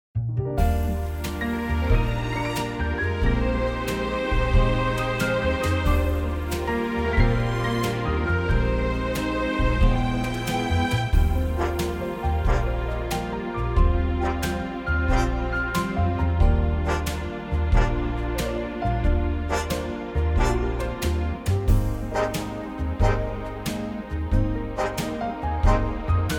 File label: Eb orchestral